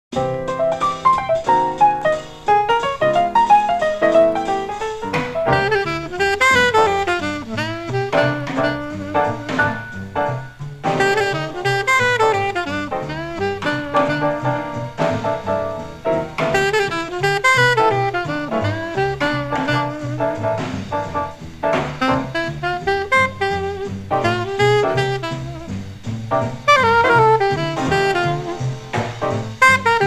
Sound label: Cool Blues